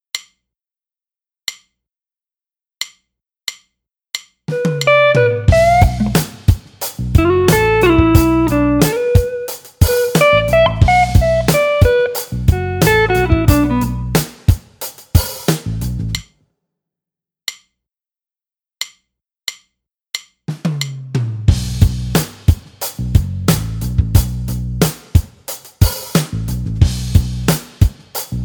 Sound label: Build Up